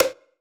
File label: Percs